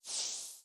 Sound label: soul pickup; Hollow Knight